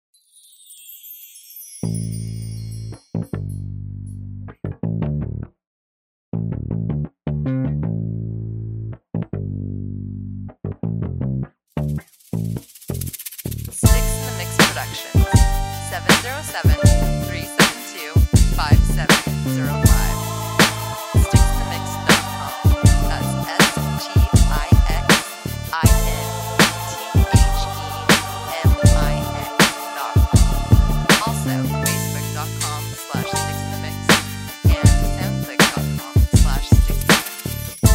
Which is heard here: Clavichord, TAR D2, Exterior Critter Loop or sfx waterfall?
Clavichord